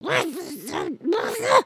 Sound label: frustrated; emotes